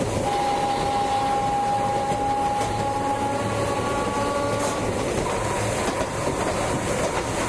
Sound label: streetcar; tetsuon